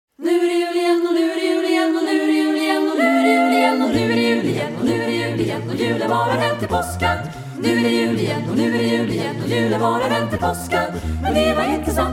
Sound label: det jul igen now christmas again vocal ensemble; weihnachten ist nun wieder; traditional